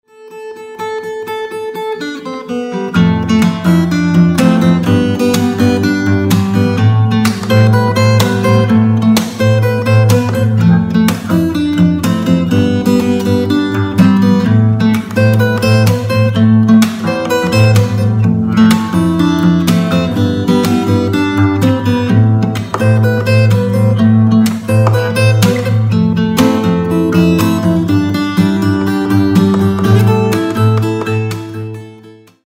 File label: guitar